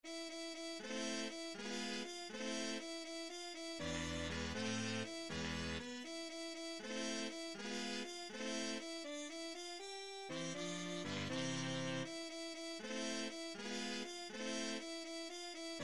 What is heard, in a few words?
classical guitar